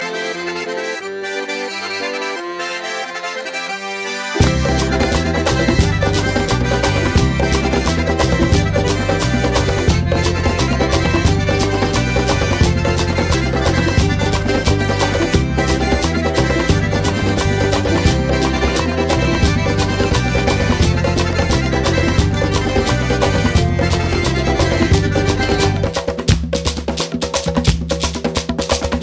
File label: Georgian